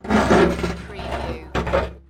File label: Heavy Metal Concrete Stress Breaking